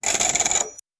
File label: metal dial rotating, lock